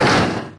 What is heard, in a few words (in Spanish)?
cerrar madera, puertas; ruidos